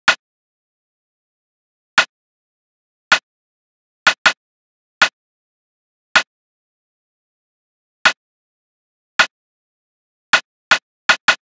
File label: Drum Loop 158 BPM Rim; Drum Loops; Cymatics; Hip Hop Sample Pack; Lightning Drum Loop